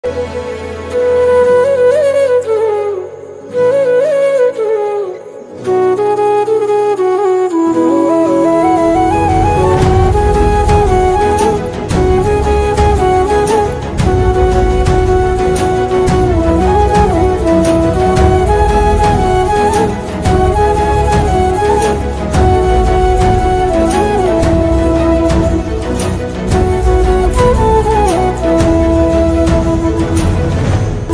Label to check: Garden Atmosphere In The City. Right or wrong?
Wrong